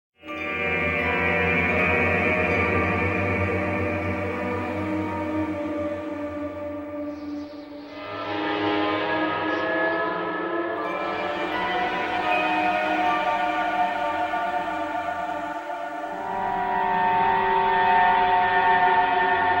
Dead suspense

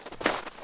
walk snow

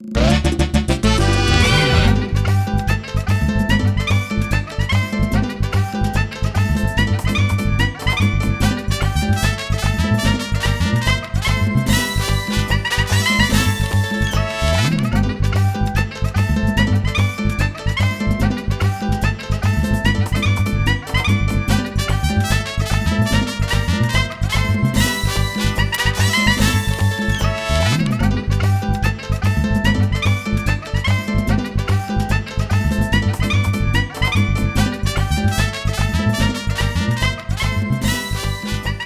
Trainer Defeated Merengue Remix; Pokemon Summer Megamix 2018; Pokemon RSE

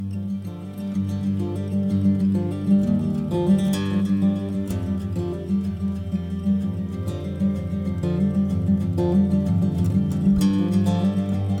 127bpm

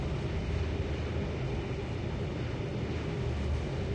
Air Conditioner

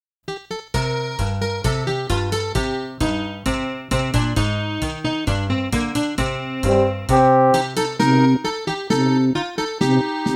Conversation; fire emblem 4 genealogy of the holy war

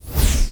WHOOSH Air Very Slow; Infernal Radiation

